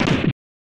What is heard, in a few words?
Hit